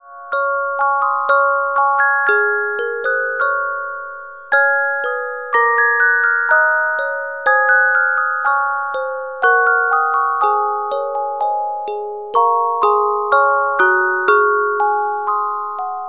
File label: Carillon Music Box Melodies